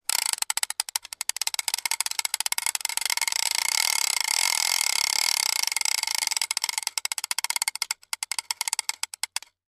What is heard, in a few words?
Fishing reel being reeled in at variable speeds with clicking at end, close up.; Reel, Fishing Click, Fishing Reel Ratchet